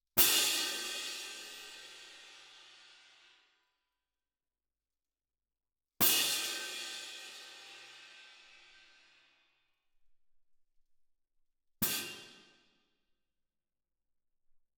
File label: Perc